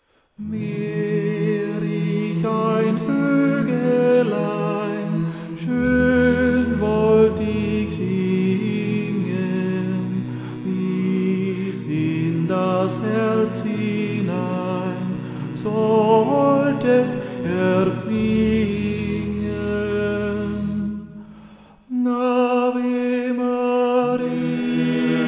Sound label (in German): Ave Maria Gloecklein